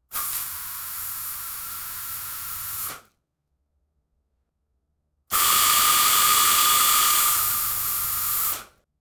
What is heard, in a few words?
multiple jets of milk spr; liquids